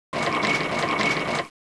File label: tractor